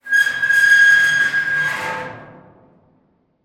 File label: Dumpster door, from inside, door, slide, rusted, screech, grinding, resonant, strong; Eiravaein Works; Vaeyan IV